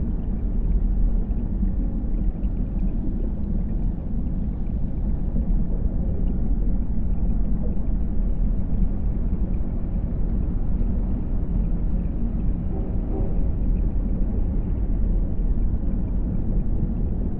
ship colony ship idle; aquatics

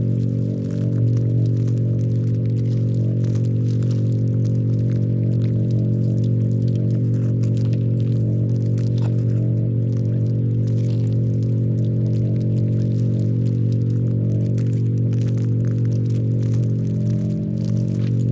pro ship construction idle; biogenesis

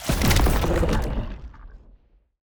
void worms bombardment; Stellaris; grand archive; weapons

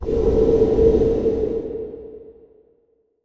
tundra planet, ambient; Stellaris

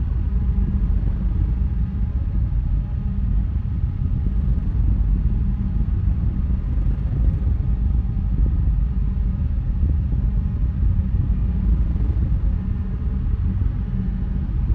cruiser hum, ambient; Stellaris

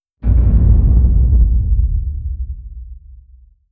distance planet killer fire; apocalypse

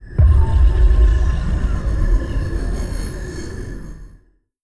event psionic; Stellaris; utopia

